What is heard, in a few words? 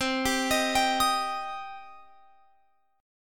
C Minor C down Guitar Open F; Cm arpeggiated